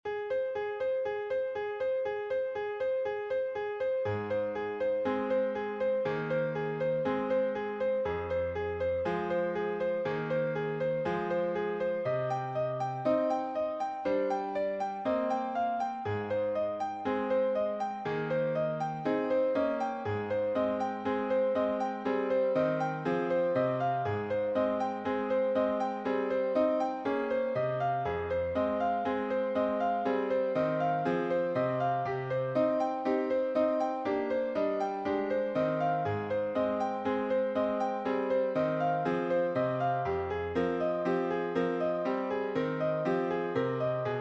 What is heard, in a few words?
clock orchestra